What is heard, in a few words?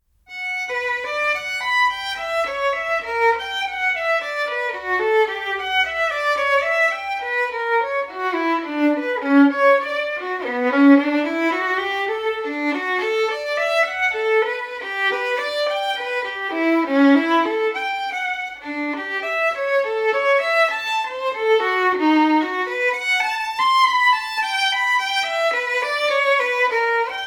Violin Partita No. 1 in B Minor, BWV 1002 II. Double; Genius 2017